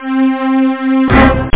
strings; Loop Scream Tracker Sample

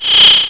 Trill Sound; CephaloTron

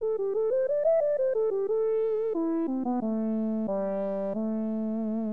WORM, Keyboards; HipHop; LiveAct Pool 1

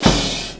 match; McDonaldland - Silly Games; McDonald's; dominoes

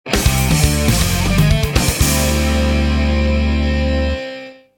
jingle, music; VirtuaTennis; CyberMycha 2002 May

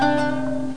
ankh; Giza 2.0

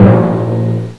deep timpani drum; Sound Effects for Multimedia - Volume 1

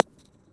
Ball Sand Roll; Tiger Woods

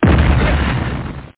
GRND HIT; ASSAULT

